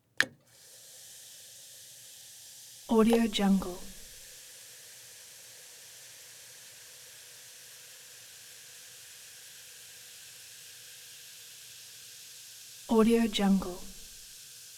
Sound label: alka seltzer; prosoundfx